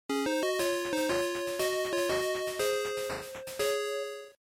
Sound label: GB Action Clear